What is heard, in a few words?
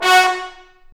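Big Band Fast, trombone; Roland L-CDC-01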